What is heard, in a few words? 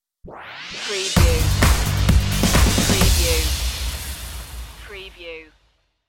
neon stinger